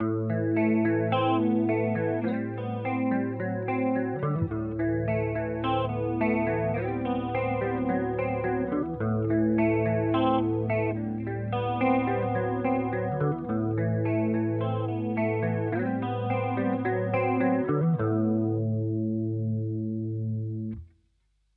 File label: HX Stomp Modulation Chorus